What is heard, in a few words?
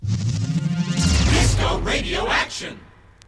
topformat maxi jingle single